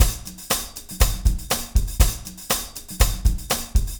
4/4 tam con; Big Fish Audio

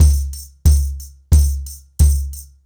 Nuclear 90BPM, ACOUSTIC DRUMS; The Roots Drumloops

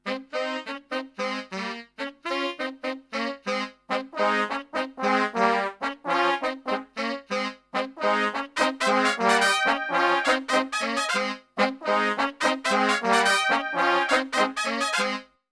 BRASIL, Brass Super Section, BRASIL LICK; Best Service

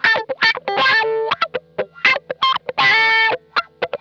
COARSE WAH, Funk Guitar; Zero G - Funk Guitar

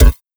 Snare, drums; Sonic Foundry (Sony Creative Software); Drums & Drones; Construction Kits; Within Kit

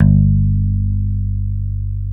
DAN OPEN G2, BASS

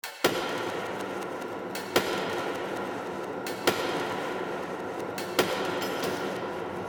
Heavy Clock 40 BPM

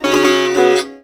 SITAR GRV, World Colours; Best Service

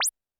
Roland TD-20 Percussion

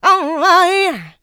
Female Vocals; Houseworx

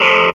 GTR, Grunge Hip Hop; USB Soundscan vol.34; AKAI; RNB 101

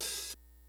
GREASY KIT, DRUMS; Kid Nepro; Elite

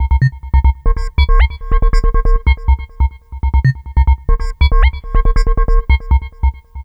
Deep Bell; Electronic Point Blank

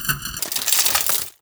SOUND EFFECT, Metal; AKAI S6000 CD-ROM - Volume 1

